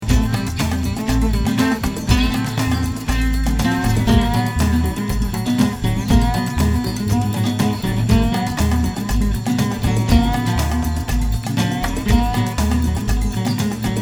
amazon mambo, jungle guitars

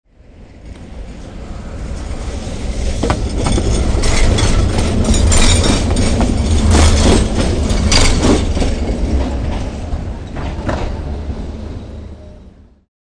trolley, russian underground

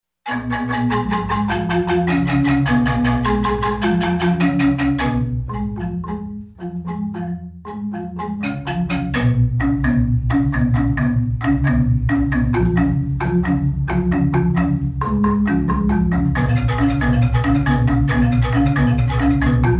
tabuh kreasi, sekejegog tingklik

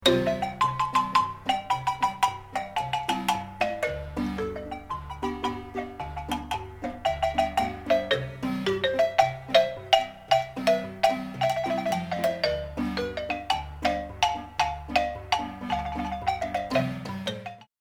jardin, marimba

